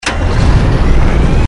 predator start

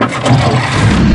black eagle start

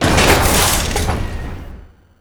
guardian cannon reload

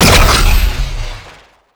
refractor fire; gdi

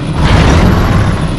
tank m24 chaffee start

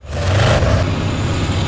t-72 engine start

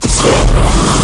flame thrower liquid fire

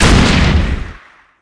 m40 recoiless rifled fire

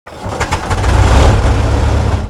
hetzer up

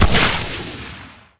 mammoth rocket; gdi

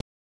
minigun loop

